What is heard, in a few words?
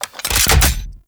Reload; Thanotech; Trumna Prime